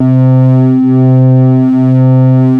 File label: MOOG BASS C4; Best Service Dream Experience